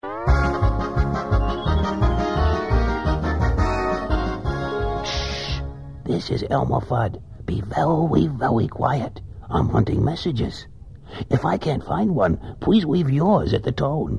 Hunting Message

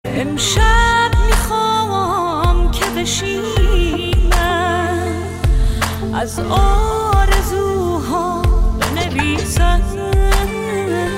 Band

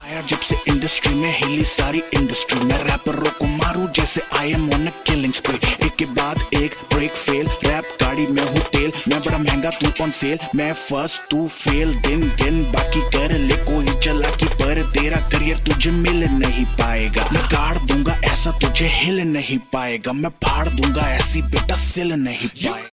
Deshi Hip Hop

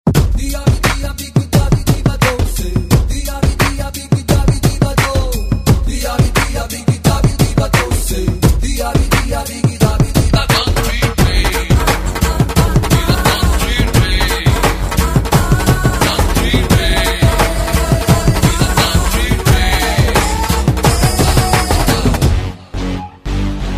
Telugu; Kantri1

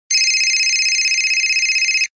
Cellular Device Tone

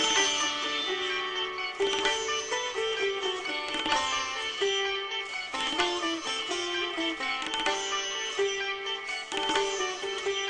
Sitar

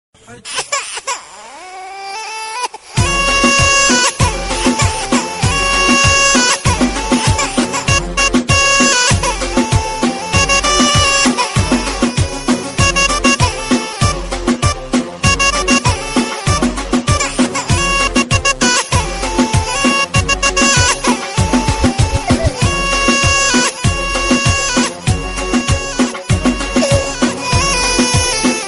Dj Babycry Drumbeat

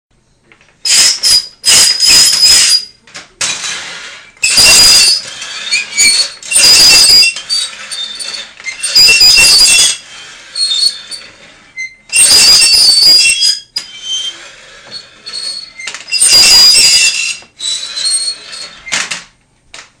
Most Annoying Sound

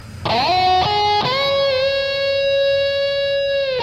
Msg Tone

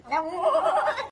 Funny Cat Sound

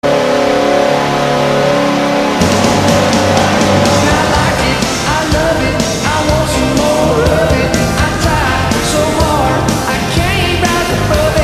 Goal Chant; Predators

